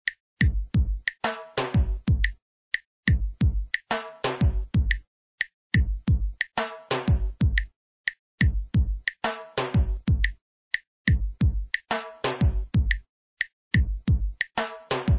timba, timbales; rhythms

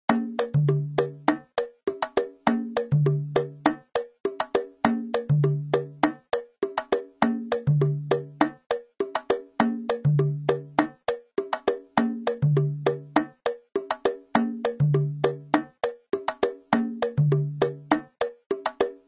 eshu, bata drums rhythms

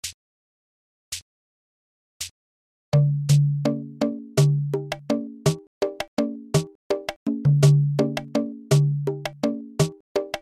rumba ochosi, batadrums; rhythms

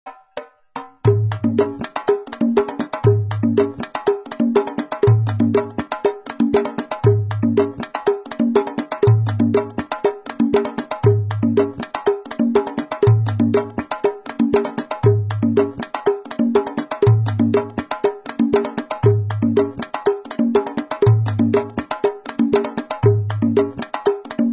chachalokpafun, batadrums; rhythms

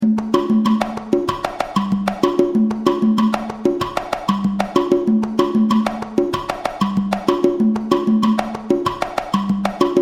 timba, congas; rhythms; Roberto Vizcaino; timba tumba

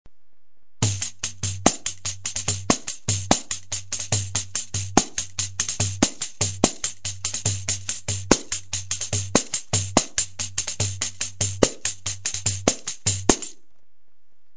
Groove, pandeiro; rhythms; Christian Velasquez; Groove4

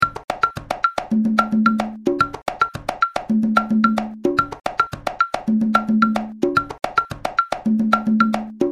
timba tumba, congas; rhythms; Laurent Lamy

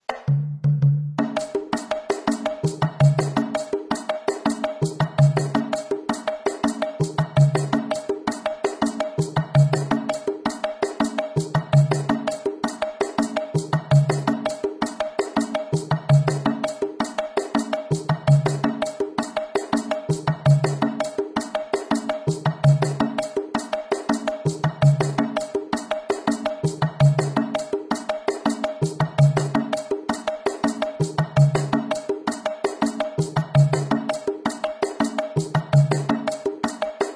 niongo, bata drums; rhythms

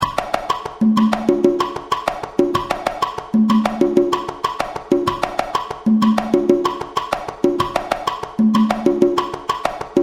timba, congas; rhythms; O Poleo; timba tumba

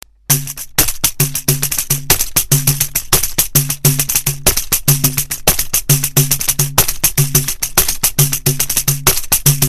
Groove, pandeiro; rhythms; Christian Velasquez; Basic pattern

